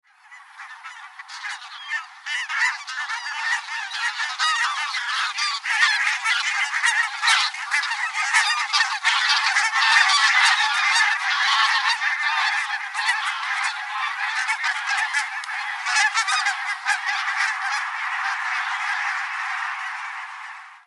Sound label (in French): bernache nonnette branta leucopsis